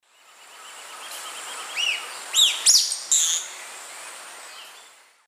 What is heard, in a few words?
Pipra erythrocephala, call; S. America; Eastern Lowlands